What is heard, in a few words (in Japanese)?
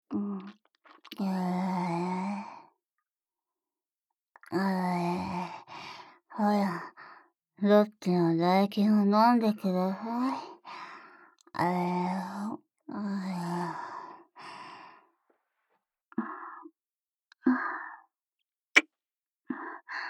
キスのみ